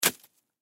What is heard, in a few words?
Ice Pick Drop; Ice (Sound Effects:Weather); Blastwave